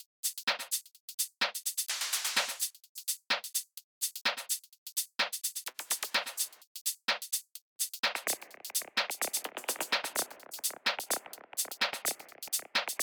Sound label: DRUM LOOP